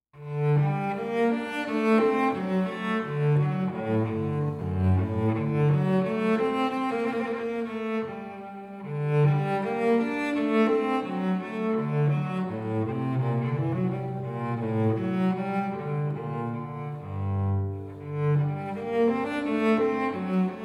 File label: Minuetto